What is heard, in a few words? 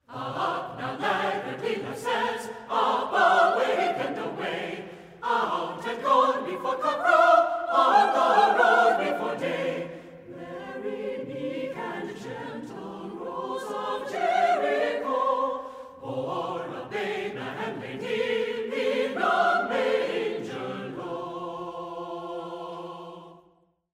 Spanish Carol; FrostyClips